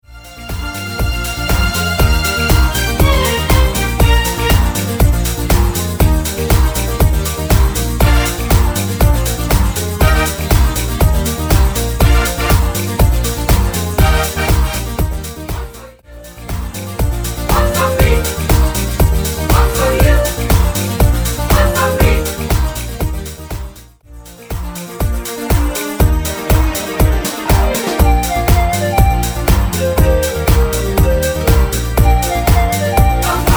Ballo Di Gruppo Canto

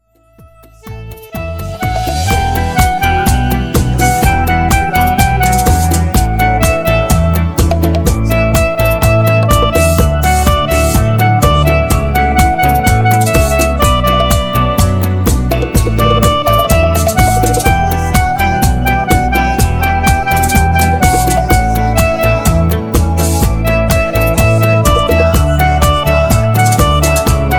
Bachata Remix Ballo Di Gruppo

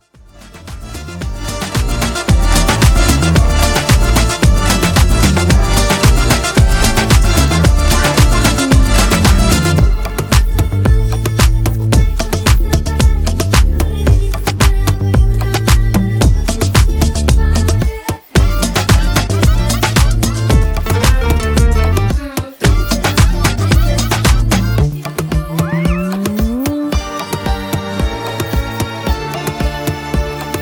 Groove Continuo; Sanremo

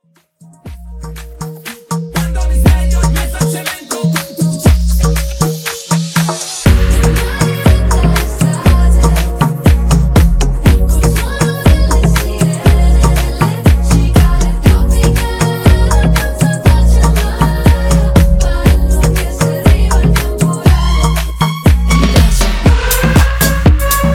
Groove Continuo; Estate 2022

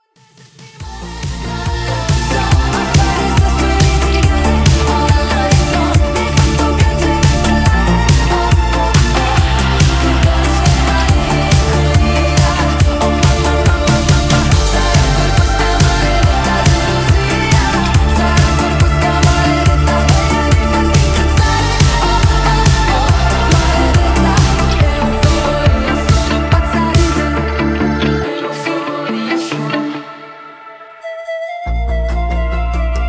Canto

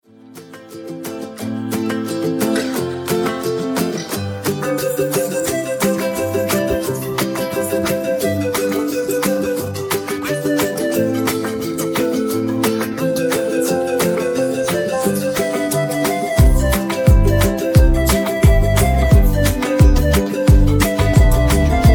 Canto

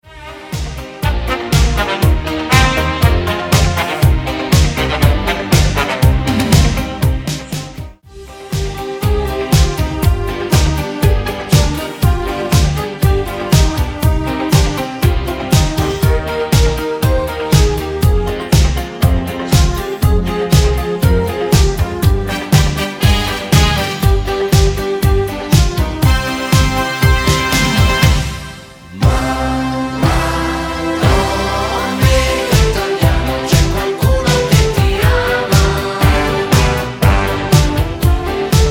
Ballo Di Gruppo Canto

